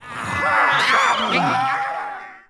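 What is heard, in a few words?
goblin murmur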